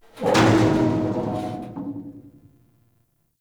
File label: Dumpster Creak Groan E; Warframe; Ambience; NineteenNinetyNine